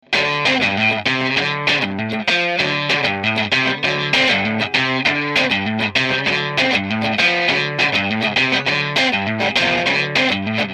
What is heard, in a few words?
strato, tweed deluxe